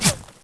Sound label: whizz; weapons